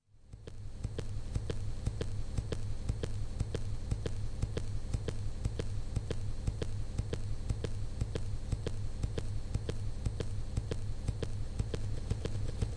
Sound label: speedmetal machine music; REMIXABLE COLLECTIONS